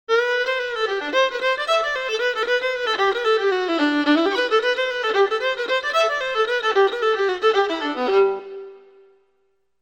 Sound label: Fiddle Version; STING